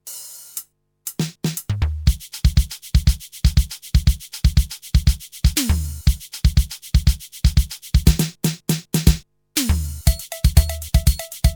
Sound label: Drumstar 80 Samba